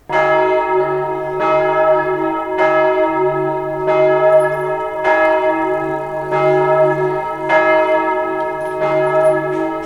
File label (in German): Loefflerglocke, glockenklang